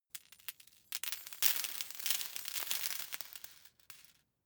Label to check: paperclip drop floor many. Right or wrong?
Right